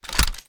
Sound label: reload end rotate; gmod